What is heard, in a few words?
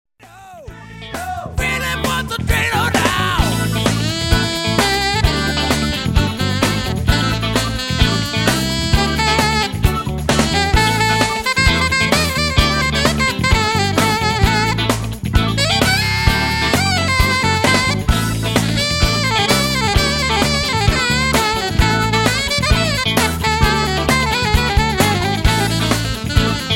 Alto, sax